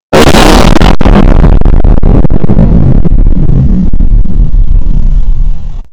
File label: deep fried vine boom